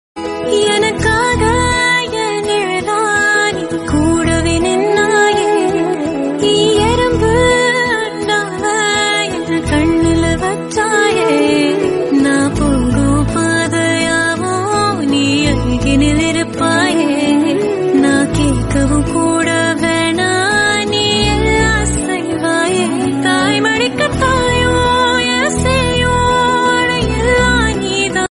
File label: Brother And Sister Song Tamil